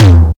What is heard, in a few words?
low mid tom drum sample e key; tom drum samples